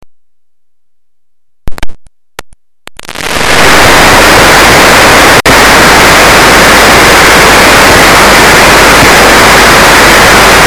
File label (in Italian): segnale audio microf; Segnale audio registrazione ambientale; 22/05/2005,Campomarzo di Lendinara. Microfono direzionale.